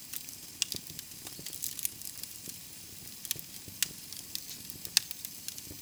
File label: fire original